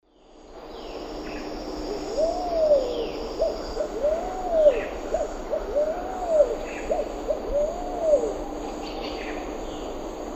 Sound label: Patagioenas cayennensis, song and calls; S. America; Eastern Lowlands